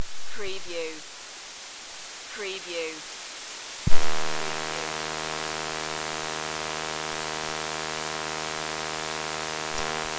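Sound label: Handheld CB Static Snow; Cobra Hanheld CB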